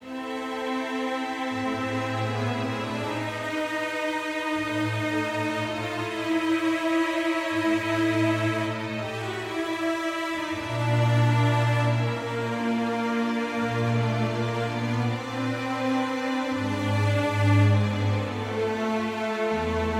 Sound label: dark forte